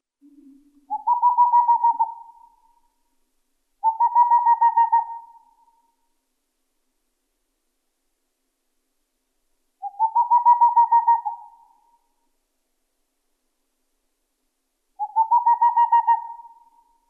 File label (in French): Chouette de Tengmalm